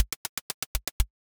drum

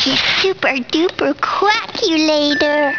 quak

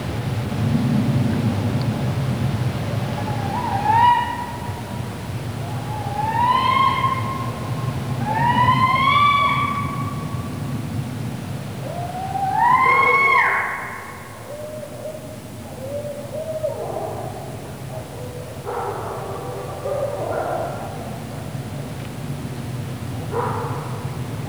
bf scream

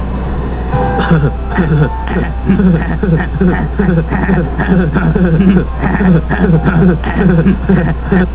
hahem

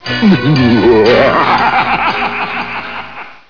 bwaahaha

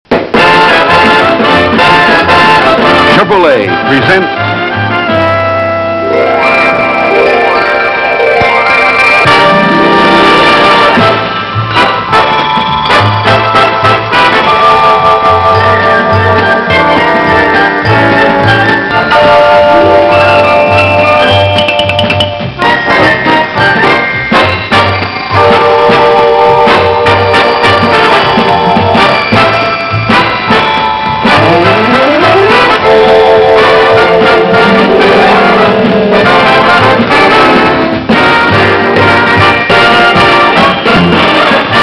chevy 68 open

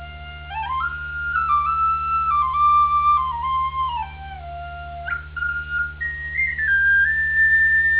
recorder nino

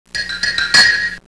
twitch

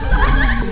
whirly

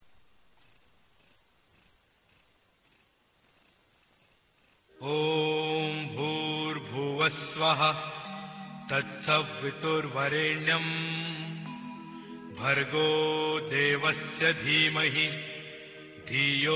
gayatri

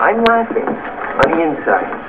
laughing

